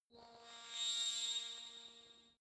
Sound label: space ringing crispy flare; Energy; energie